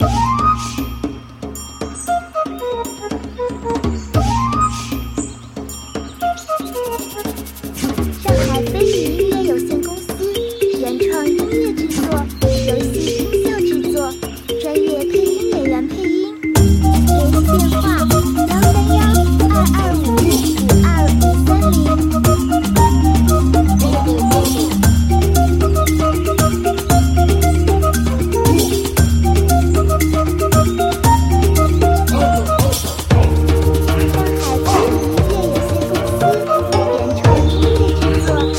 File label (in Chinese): Q版轻松; 部落争霸